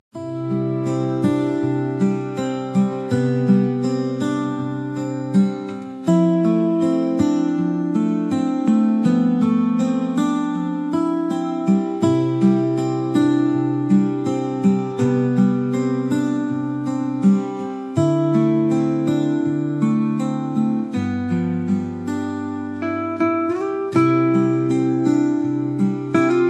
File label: guitar